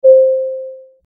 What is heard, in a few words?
elevator ding